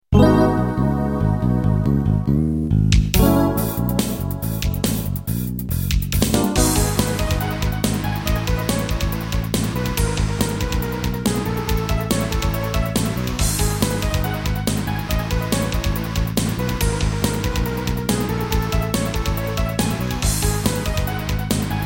music end; psylocke

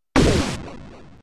Misile; Masao Misile (Masao Missile); Prototype

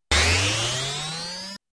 air bike

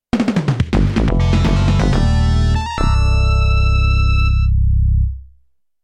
Prototype Round Clear; Shinobi 3

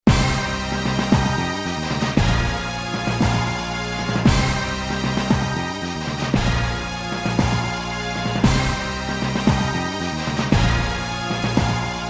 music victory; doom

